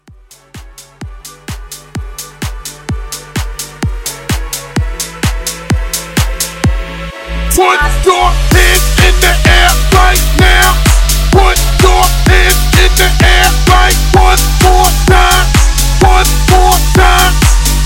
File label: Hype, 128